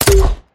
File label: potato cannon, guns